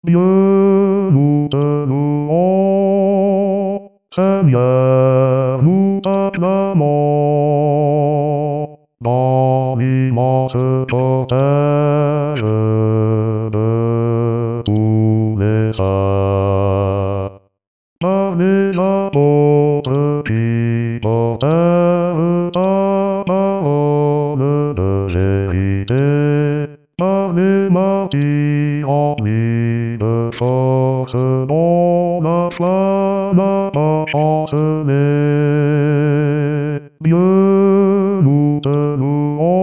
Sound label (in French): Dieu nous te louons 4 voix